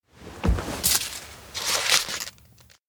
crumpled note pick open; anemoi

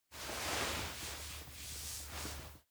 pat head; anemoi

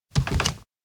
window peek; anemoi

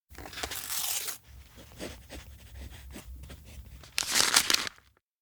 note tear edge; rikka; anemoi

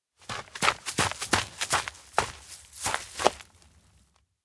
steps outdoor grassland dash to tree; anemoi

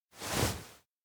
turn; mitsu; to mugi; anemoi

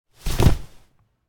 human fall catch; anemoi

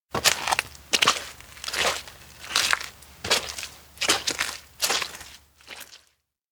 walk fast mountain rain; fumiya; anemoi

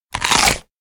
carrot bite; anemoi

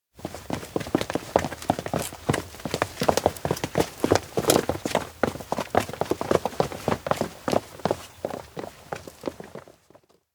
steps outdoor townstreet evacuees; anemoi

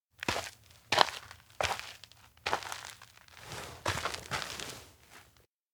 steps outdoor walk; to jet; anemoi

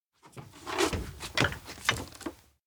breakfast carry; rikka; anemoi

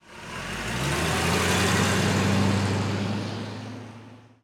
car leave; anemoi

